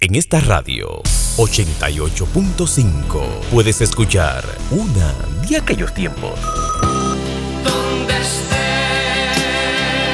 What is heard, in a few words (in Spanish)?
Musica Suave; Studio 88